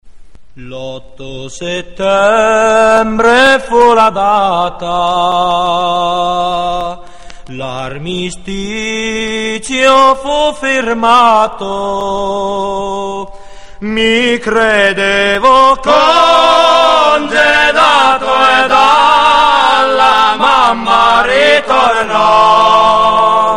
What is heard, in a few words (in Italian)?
canto